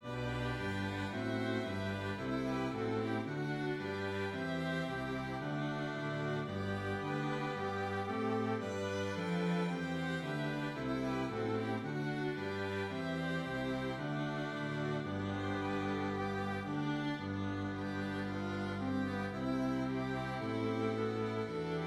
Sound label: Strings; All Things Bright and Beautiful (Royal Oak)